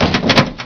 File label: latch locked; buttons